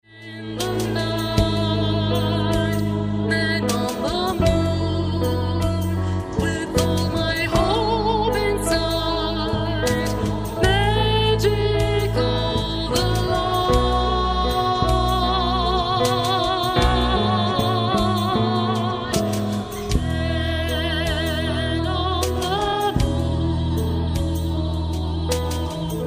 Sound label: bassline